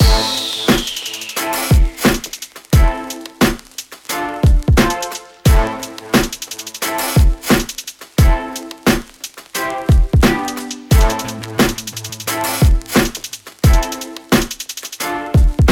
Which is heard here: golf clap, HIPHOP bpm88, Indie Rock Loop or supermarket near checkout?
HIPHOP bpm88